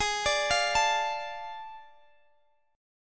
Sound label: A Major 7th Suspended 4th Sharp 5th